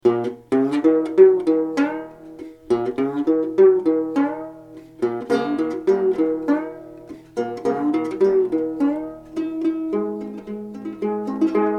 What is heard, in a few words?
tin banjo blues